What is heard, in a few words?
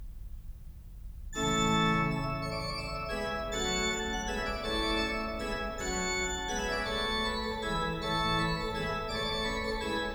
Organ Concerto in A major, Allegro; Oscar's Motet Choir - Cantate Domino (1976); Walther; after Gentili; LV 130